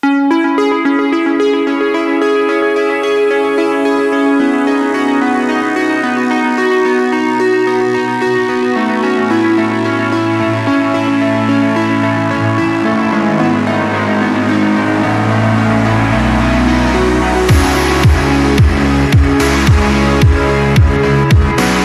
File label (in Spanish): musica electronica airwaves